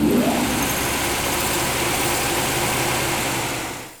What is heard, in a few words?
drill boss drill